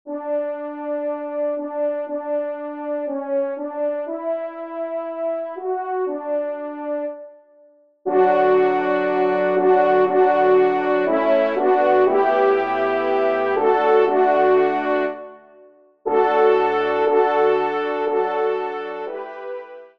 Kyrie, trompe; Messe de Rochard

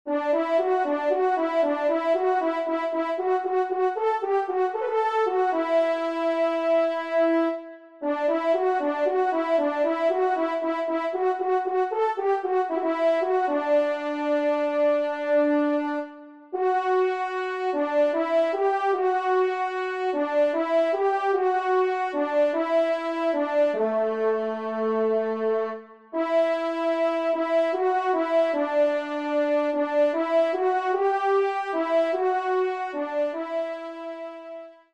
trompe; Clair de Lune

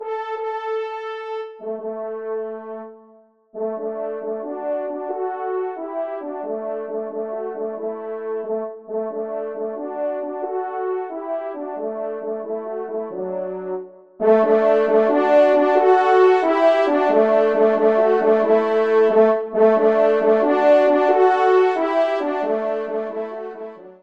TRP; Guillaume Tell Rossini; Grus; Auteur : Rossini

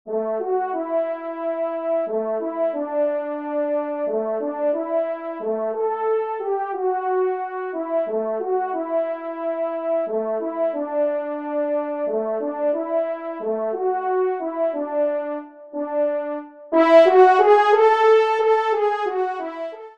TRP; Fete au village; Poule

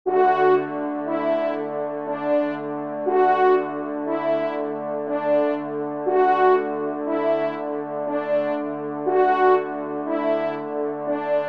Les Cloches; Lambert

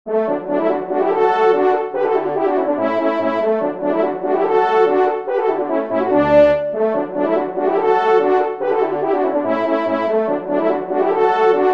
Trio Cors

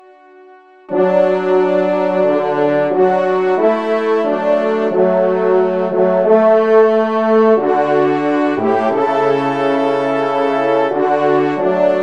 Communion, trompe; Devert; 2e Messe Communion

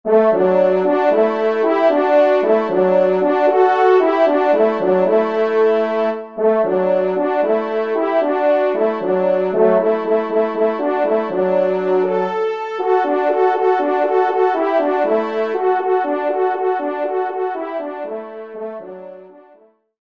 TRP

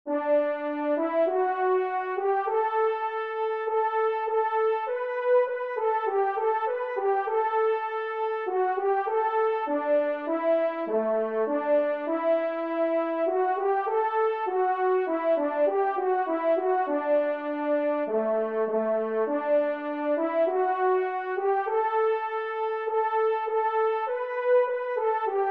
Entree Do Maj; Corrette

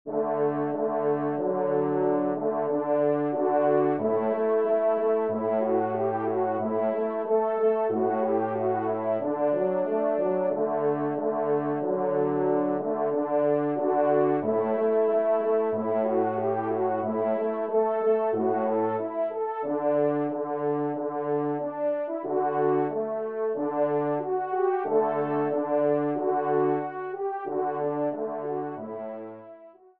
trompe

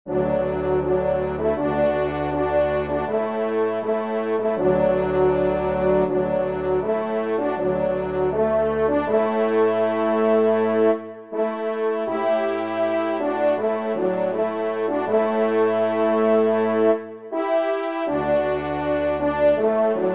Hymne a Saint Hubert

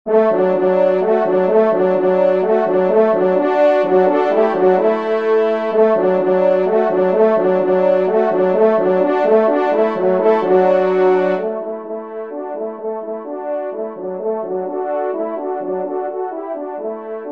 Allegro de chasse, trompe; 2e Trompe

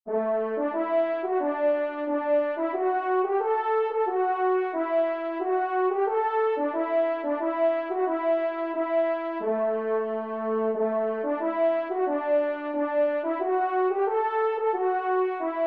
Tambourin Do Maj; 3e Tambourin; Corrette

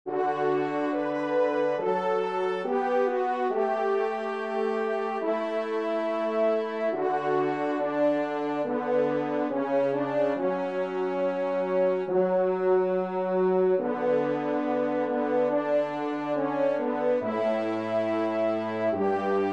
Kyrie, trompe; Devert; 2e Messe Kyrie

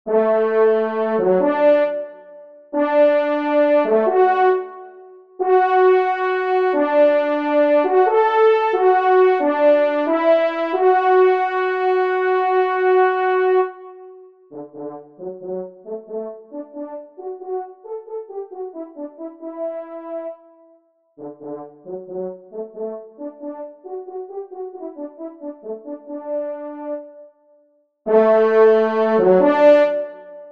Trompes; 1ère Trompe; Cors en Ré et Piano; Rendez Vous de Chasse; Rossini; Auteur : G. Rossini

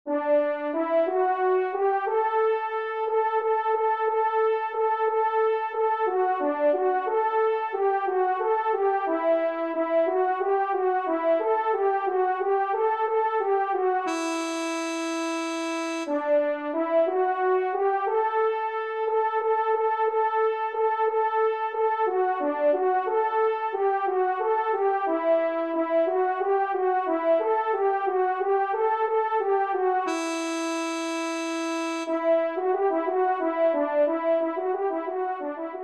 Caprice Do Maj; Corrette

